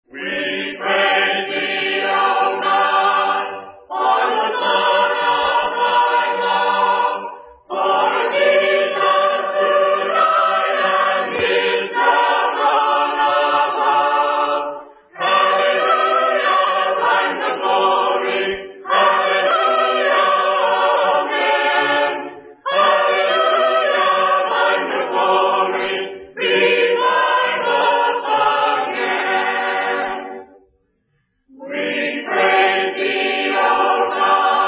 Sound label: a capella hymn; revive us again